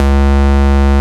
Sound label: Vengeance Ultimate Bass C#, Root C#; Vengeance Samples